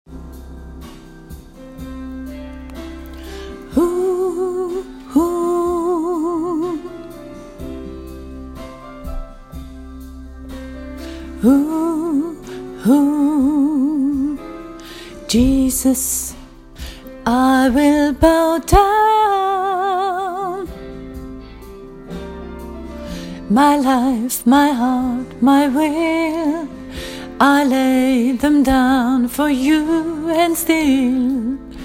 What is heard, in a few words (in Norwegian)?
sopran; åfjord